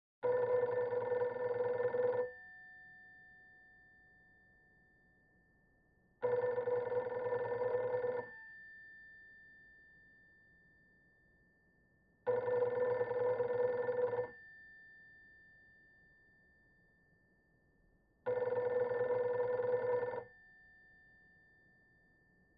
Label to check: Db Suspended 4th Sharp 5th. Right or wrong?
Wrong